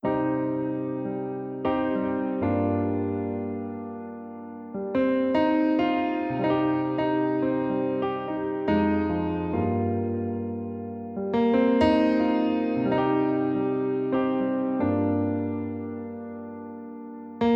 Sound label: Melodrama Solo; Solos